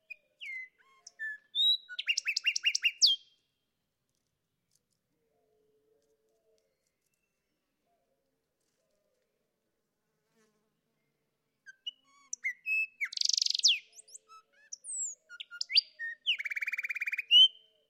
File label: Sinaloa Wren